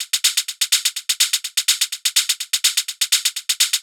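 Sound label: Hat Loop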